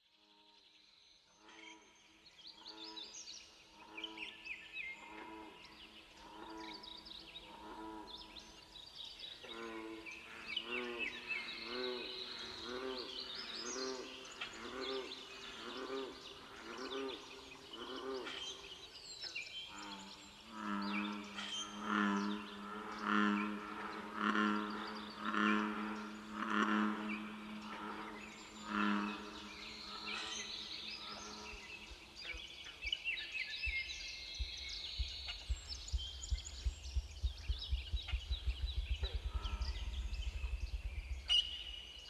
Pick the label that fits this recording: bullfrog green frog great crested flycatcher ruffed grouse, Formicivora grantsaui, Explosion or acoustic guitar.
bullfrog green frog great crested flycatcher ruffed grouse